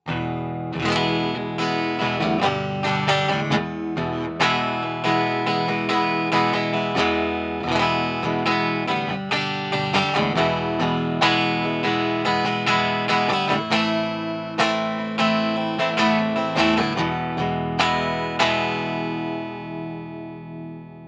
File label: clean; strandberger